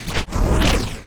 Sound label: wooshes transform sweetener